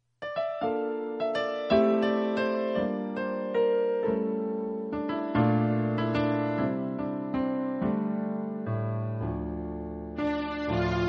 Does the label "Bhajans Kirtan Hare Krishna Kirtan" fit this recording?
No